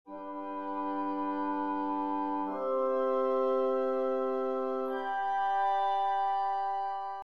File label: chords; Nikolai Rimsky Korsakov